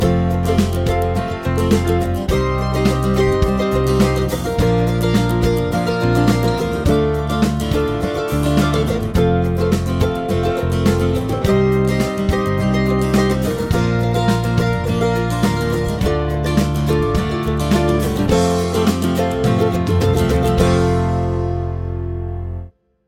chord prog with mixture

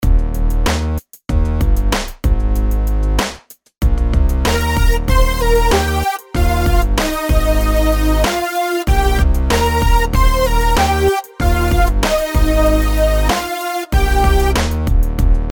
melodies simple Ebmaj; melody

